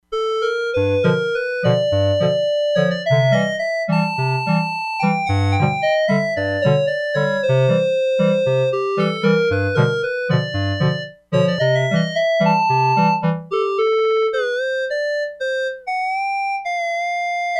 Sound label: CLARINETS; Factory Programs